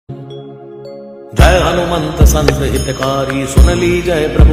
Hanuman Chalisa Tone; Hanuman Ji Tones for Mobile

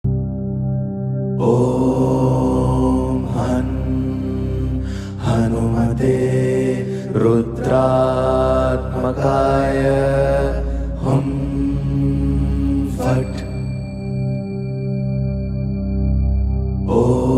om han hanumate namah mantra; hanuman tone

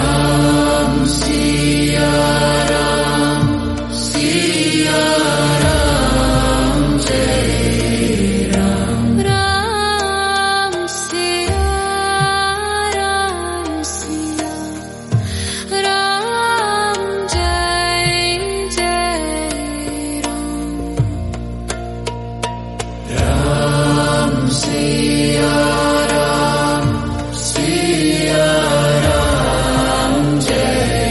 ringtone female version

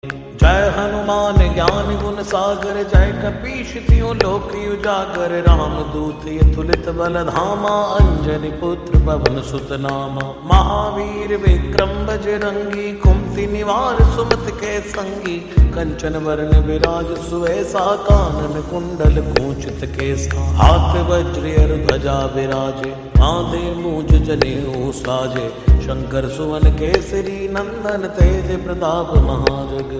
hanuman chalisa Ringtone slowed

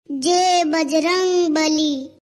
jai bajrang bali message tone ringtone; hanuman message tone

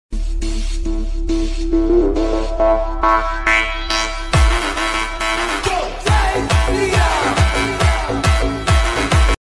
jai hanuman sms tone; hanuman message tone

